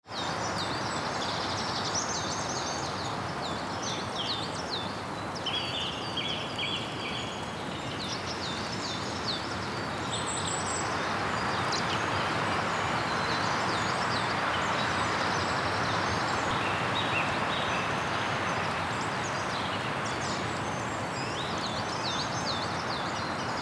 drizzle in the forest

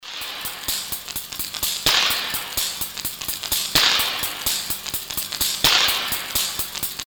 funny machine fx

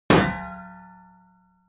shield metal impact ring

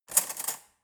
cutlery fork drawer handle grab

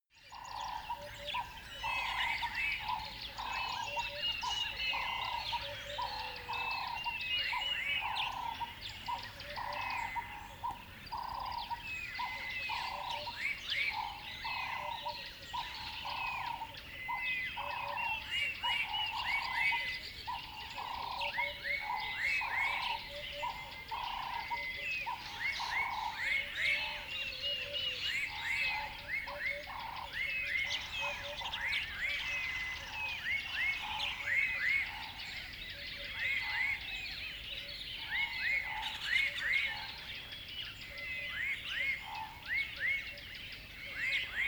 river bed dawn birds call quack

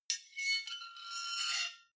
two knives large scrape creak

